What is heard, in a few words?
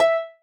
marimba rainbow; ゆめ2っき